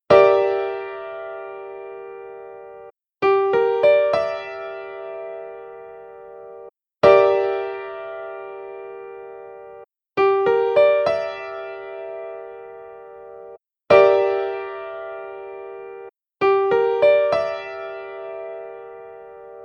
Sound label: Gm6 piano chord